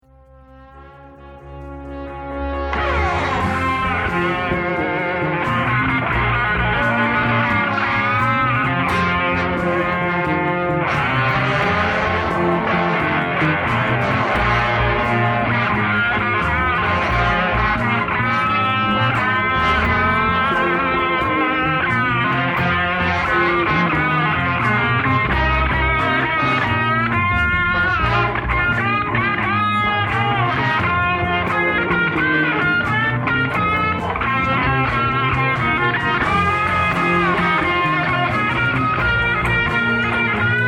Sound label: Solo